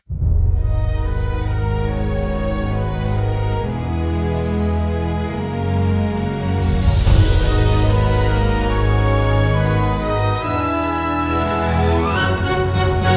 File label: Rock Orchestral Version; teaser